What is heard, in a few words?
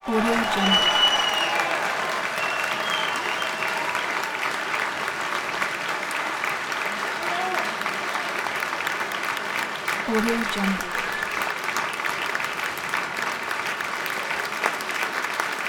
applause cheering